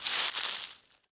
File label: leaves step; Golden Crayon Sounds